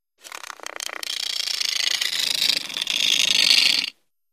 Snake, King Rattles. Snake Shakes Its Tail. Close Perspective.